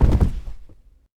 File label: Body hit